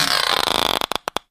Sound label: Lizard Tokay Gecko